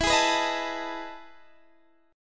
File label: E Major 11th E down Guitar Standard; EM11 strummed; JGuitar